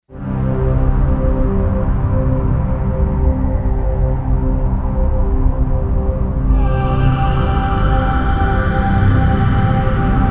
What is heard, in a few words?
Low Sweep; Roland SC-880; Factory Patches